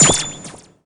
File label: Sleep Knife; Inotia 3; Rouge